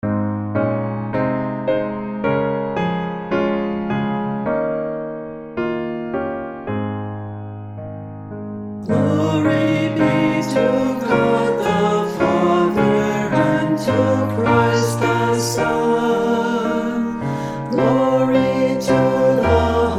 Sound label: sing; Hymns; Hymnal